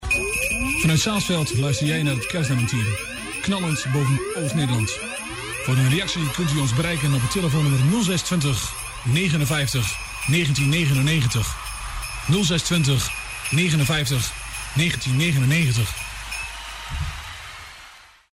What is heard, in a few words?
Jingle; Audio Fragmenten